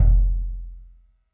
BASS DRUM, ORCH PERC; Greytsounds VOL-1; ORCH PERC 3

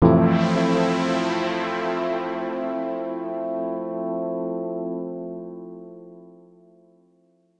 D&B Pad; Keys; neuro

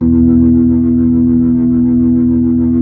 B-3

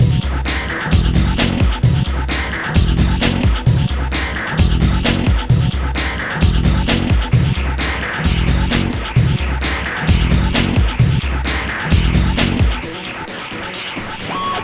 Funk, Hardcore Breakbeat, 131 F#min; Big Fish Audio; Hardcore Breakbeat Action; Funk Factory

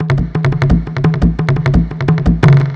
Tabla Effekts, percussion loops; KJ Sawka; Drum n Bass & Beyond; Drum Tool Box

